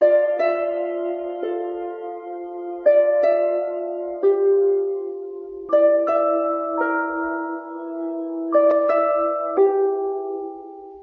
guitar, Loops, Sad

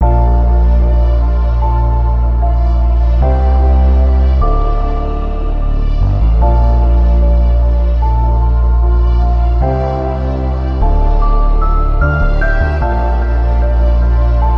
keys distant yells

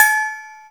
Bells

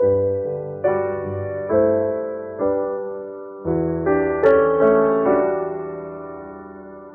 piano, Loops Sad

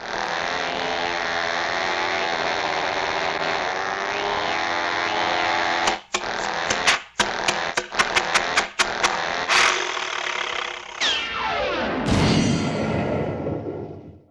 air crash tiny

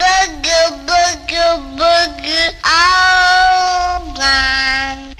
baby

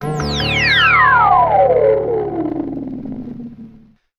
electronic swirlig

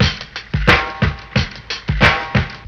ringy snare, drum loops boombap

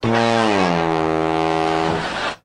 fail horn raspberry

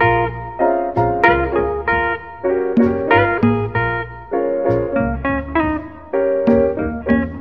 guitar and piano, Phonky Loops

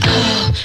ah

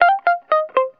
guitar, Phonky loops

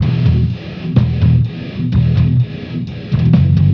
bass, Loops

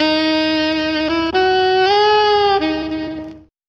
saxy lady, Stabs

